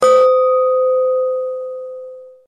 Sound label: Chi Gong